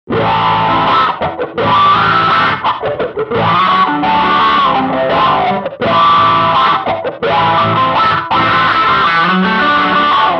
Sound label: Dirty Blues Auto Wah